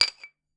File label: glass; Phasmophobia